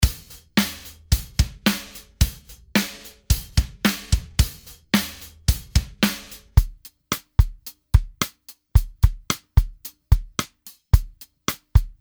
rex drum

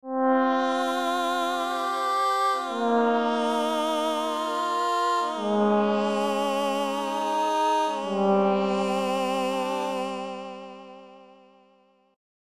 Space Opera

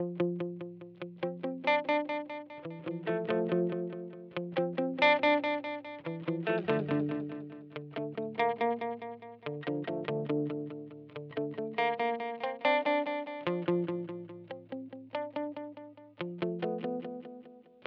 Simplifier DLX Stereo FX Loop; Specular Tempus

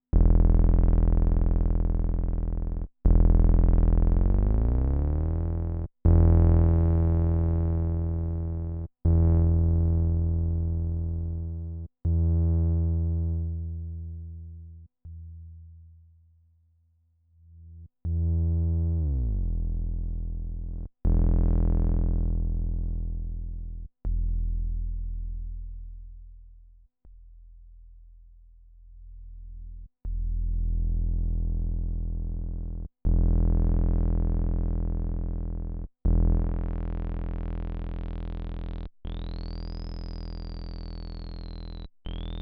Korg Nubass SAW Filter Reso

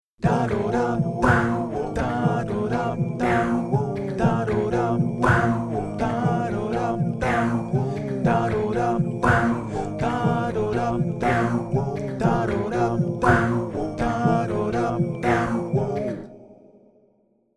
Phoenix Audio Ascent One Vocals Collage Wet

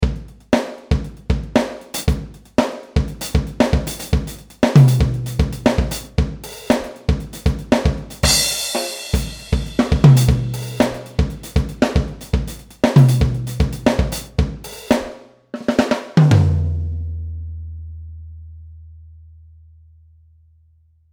funk Pearl; BFD Factory; Pearl BLX

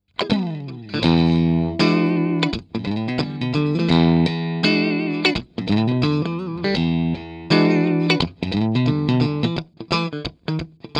Clean Neck plus Bridge PU Single Coil

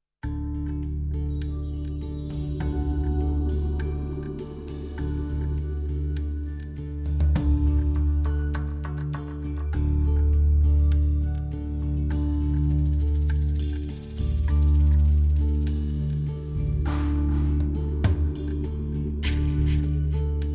Yamaha PSR A5000 Style; Halk

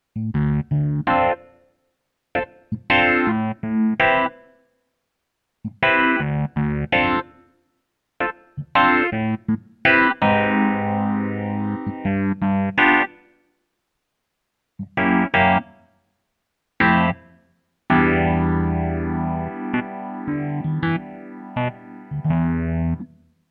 Kawai ES 520 Classic EP

